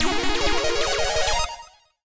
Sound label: win game; rollingballs